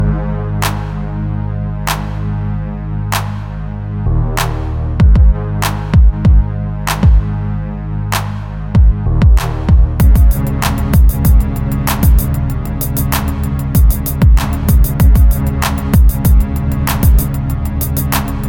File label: dirty strings